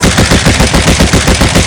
Automatic AGS-17, Weapons; DCS

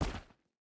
footstep rubber boots stones jump land; Rust

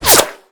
Projectile Sounds; Red Orchestra 41-45

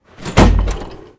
Vehicle Hatches; Red Orchestra Ostfront 41-45

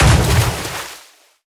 depth bomb; World Of Warships; weapons

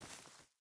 footstep barefoot grass walk; Rust

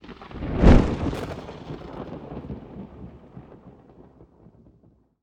Amb Weather; Red Orchestra Ostfront 41-45

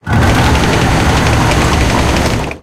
tank engine int load, engines tanks; War Thunder

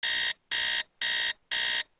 Alarm; Civ4 warlords

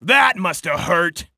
taunt hurt

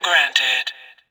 door granted

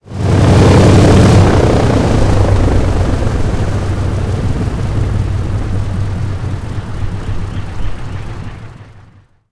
engine c46 stop cockpit; War Thunder; engines

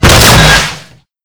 javelin fire; Modern Warfare

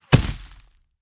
generic bullet impact; Rust

